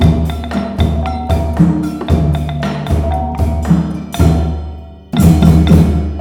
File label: MALAY R, Heart of Asia